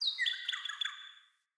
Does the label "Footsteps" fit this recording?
No